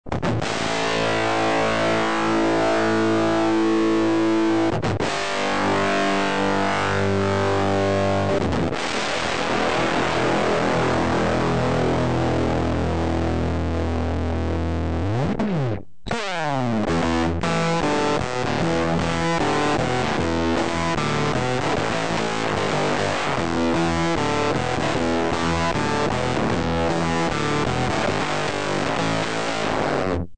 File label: Buzz Box, Set to maximum Gain, Strat; Recorded by Gary Burchett